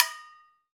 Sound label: Fijidera; Club-50 - Foundations Roland; Agogos